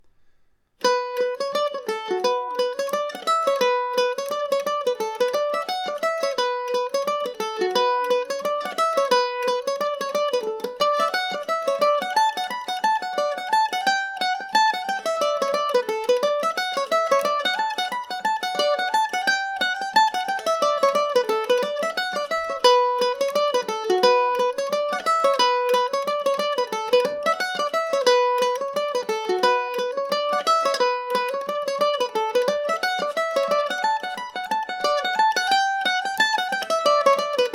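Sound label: B minor, irish mandolin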